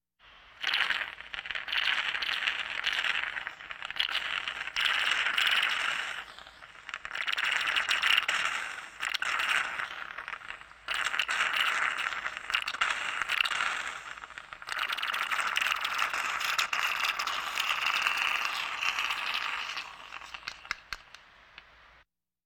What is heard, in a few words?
Hospital effects